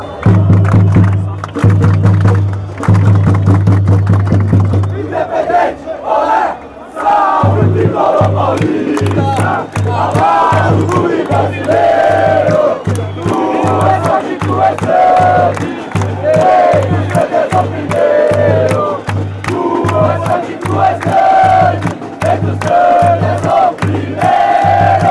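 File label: sao paulo chant, football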